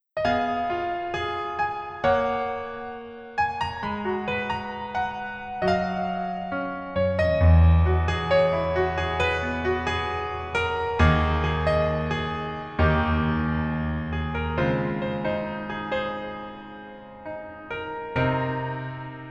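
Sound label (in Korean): Piano version 멜로디MR -1키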